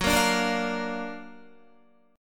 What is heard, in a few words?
Gb Augmented Major 7th Gb down Guitar 4F,4A,5C,5G,5C,6E; Gb+M7 strummed; JGuitar